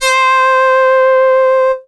Yamaha TX81Z Violin With Vibrato C5

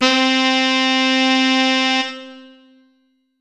Ensoniq SD-1 Saxophone C5

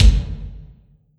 Yamaha RM50 Rock Kick

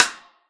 Yamaha RM50 Side Stick

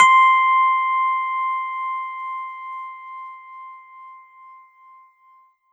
E Piano C6; Korg NS5R

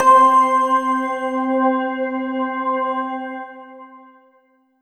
Roland JV 2080 Mallet Pad C4

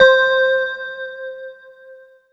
Korg 01W Xpress Bell C5

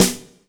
Korg TR Rack Standard Kit Snare Drum

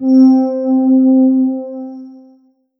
Roland SC-88 Bowed Pad C4

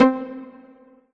Roland D-20 Pizzicato C4

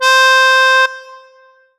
Roland SC-88 Harmonica C5

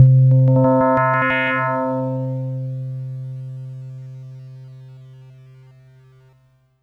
Yamaha PSS-170 Hand Bell C3

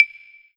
Korg TR Rack Standard Kit High Wood Block

